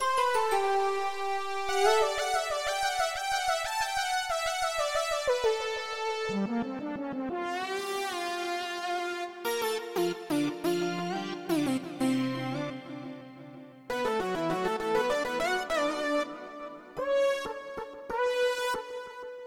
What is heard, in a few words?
LEAD